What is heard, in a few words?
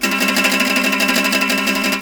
JAKAY; Spectrasonics; Heart of Asia